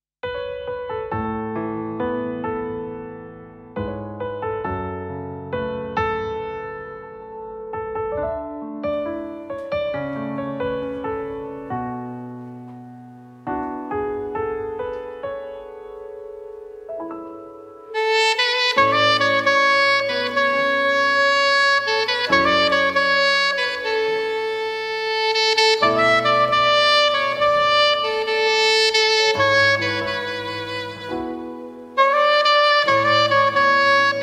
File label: Saxophone Cover